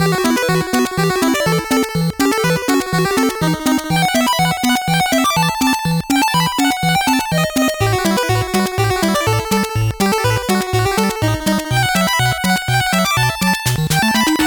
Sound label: 8bit BGM